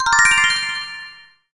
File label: Get xp